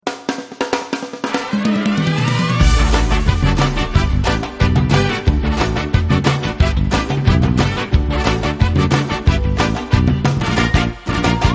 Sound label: mpb samba; sampler mpb